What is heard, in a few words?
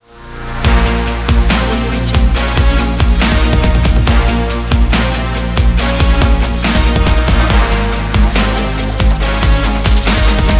action sport dubstep ident; puremusic